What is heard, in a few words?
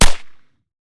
sr3 silencer, weapon; metro